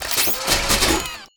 Menu Scifi Interface; Menu FX

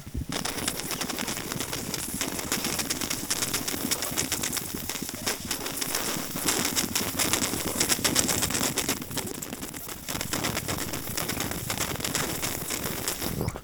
Water Bubbles Slurp Bong; SFX Kit; Foley - Misc

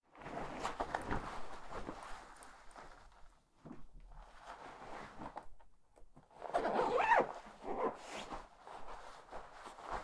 jacket put on; human; NS Studios - ultra sound collection

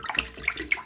Water Splash Toilet Water; SFX Kit; Foley - Misc

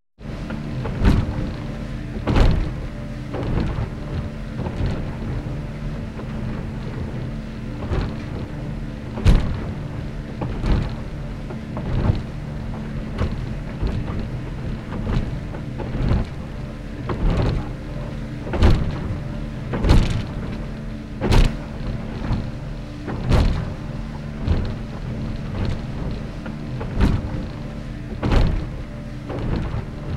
Boat Crusing Fast Inland Water; KMP Film Video Sound Effects

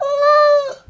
cat Meow; DeadFright

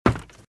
Footsteps - Wood - Creaky - Run - Right

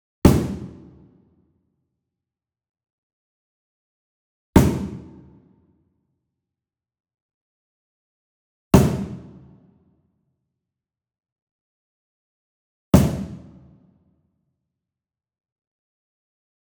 Wood Percussion Cajon Hit Coconut Beater Medium; boom library; Cinematic Strikes Construction Kit

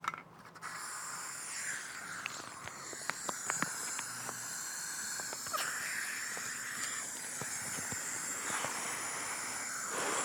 Oxygen Tank; Medical; Blastwave

